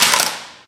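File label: physics clipboard impact medium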